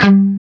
CHUG G 2 L, Guitar; East West; Steve Stevens Guitar Samples Collection

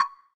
WOODBLOCK; EdgeSounds; Drum Mashines; R-8 DRUMS

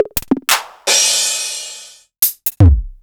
Korg Electribe ER-1 Kit

